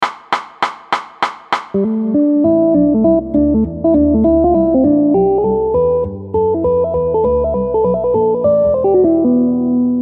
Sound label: interval